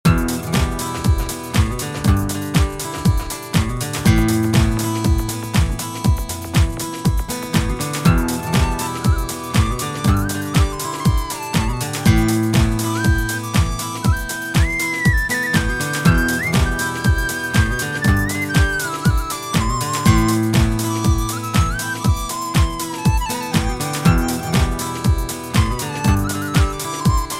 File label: techno guitar